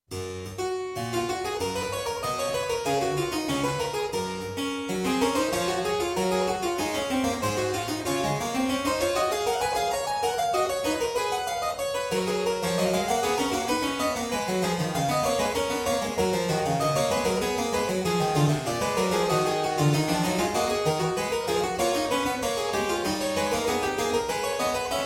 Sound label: Invention No.10 in G for Harpsichord; Bach